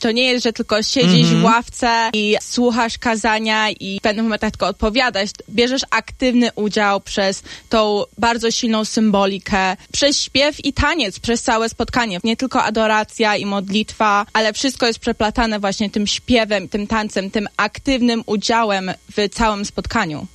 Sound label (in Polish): hejnar